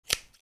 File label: Large scissors snip